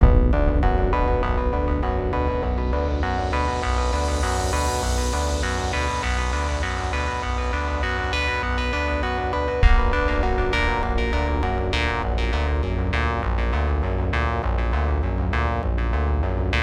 LOOP; Strike Suit Zero

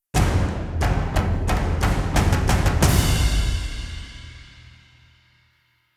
Combat Out; The Witcher 3 Wild Hunt; Skellige

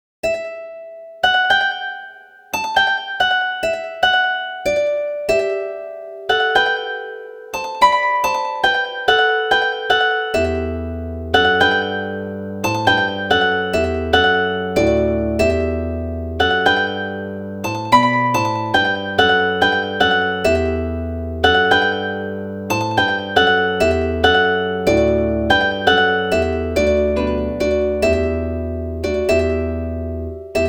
Acoustic

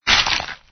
skeleton bone collision; Angry Birds Seasons

